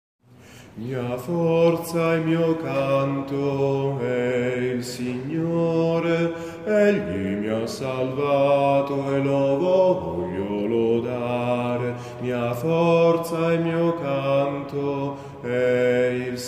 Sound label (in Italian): bassi